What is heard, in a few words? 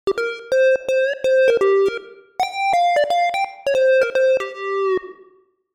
Jupiter 8 hammer lead; opxpro3